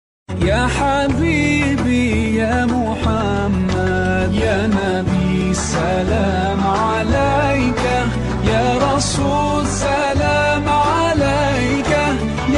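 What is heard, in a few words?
devotional islamic arabic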